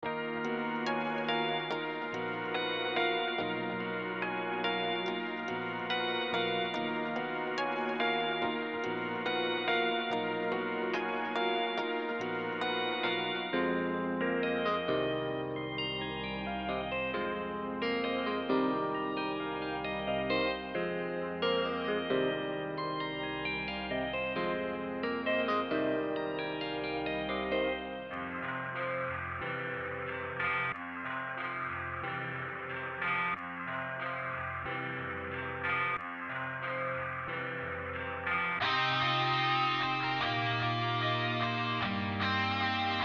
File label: guitar vibez, trap; Studio Trap